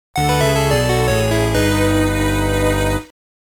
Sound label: Miss; Mario Party-e